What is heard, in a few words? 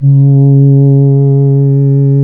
C#, Synclav FM, WIND; Big Fish Audio